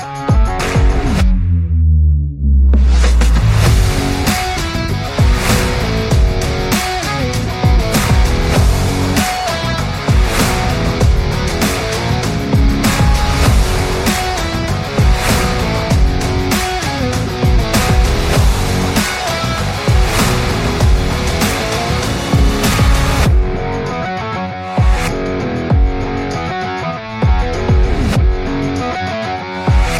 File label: Rock Sports Fashion and Dynamic, ringtone